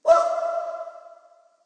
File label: Slice Chant; drums; hip hop; STYLE KITS (Hip Hop, Etc); Khezie Hip Hop Kit